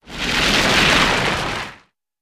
Short, Gravely Rock Slide ( I.e. Dumping Small Load Of Gravel )